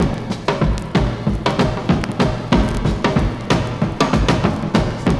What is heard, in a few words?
NOISY VERBY; Univers Sons - Kitch 70s